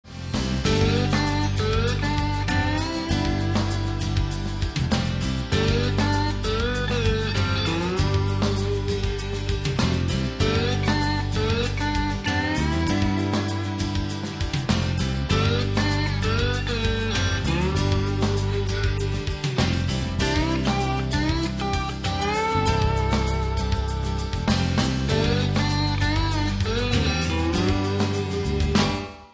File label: Country Funk